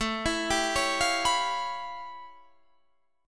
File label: A 7th Flat 13th; A7b13; arpeggiated